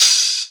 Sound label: Open Hihat; Vengeance Samples; VEE Cymbals